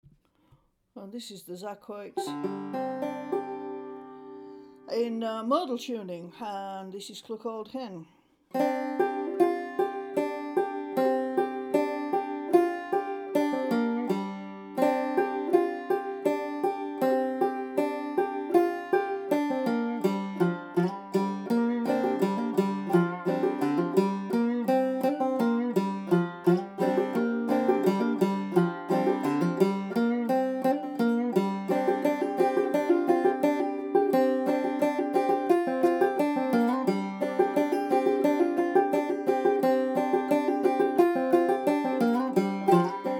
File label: banjo; Cluck Old Hen